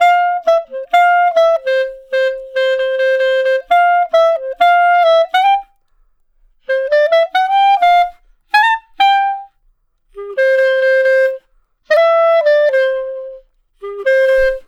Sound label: Clarinet Solo; Jazz; Traditional; Clarinet Loops; Sonic Foundry (Sony Creative Software); Jazz Solos and Sections